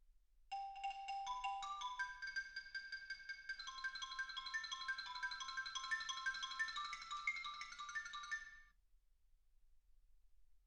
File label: xylophone